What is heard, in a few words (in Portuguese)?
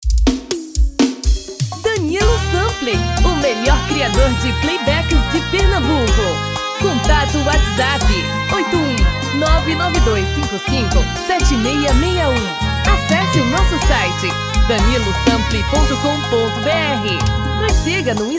Seresta dois tom abaixo